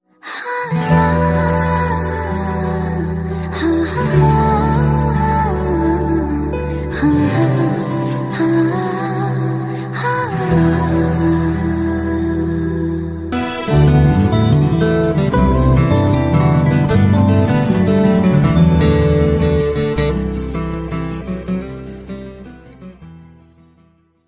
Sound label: instrumental